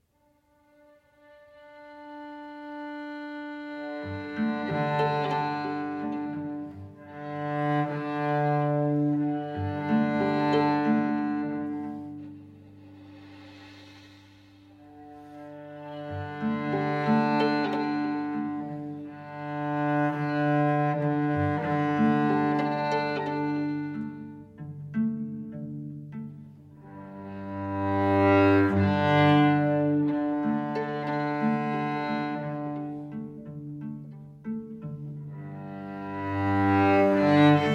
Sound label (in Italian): per violino violoncello e pianoforte; corde vuote